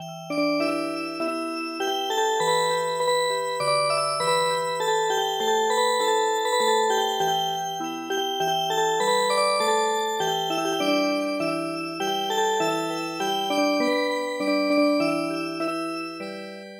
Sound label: Music Box, traditional